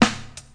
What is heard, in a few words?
full steel snare drum sound a sharp key